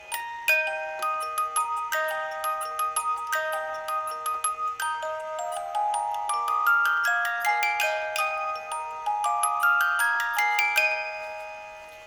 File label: Music Box Loop Fast